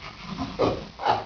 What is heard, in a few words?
GRUNT; ANIMALS